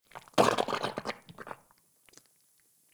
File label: Ext Goop Thick Burst; Warframe; Infested Eris